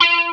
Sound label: Distortion Gtr; E-MU Producer Series Vol. 8 – Platinum Phatt; PlatinumPhattCD2; JAP NOTE